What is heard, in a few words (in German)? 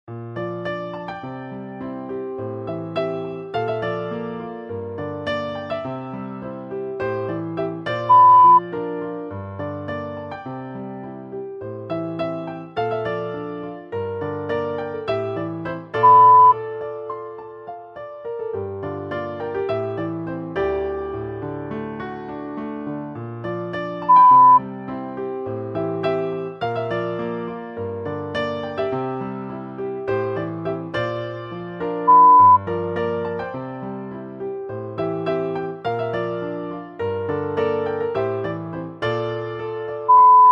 Klavier Balladen